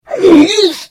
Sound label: Hit; The Elder Scrolls III - Morrowind